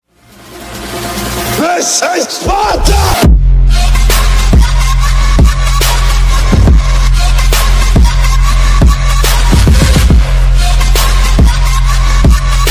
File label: bass boosted remix; this is sparta